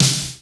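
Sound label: rock snare, 01W DRUMS; Crypton - DrumBase5000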